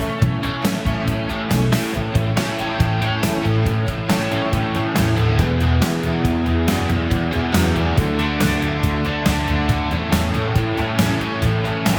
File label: loop guitar solo bossa nova C minor bpm 100